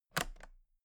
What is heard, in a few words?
toolbox latches close regular